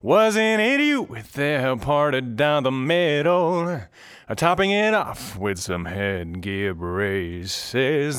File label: Proximity Control Focus Dynamic EQ, Mixing Lead Vocals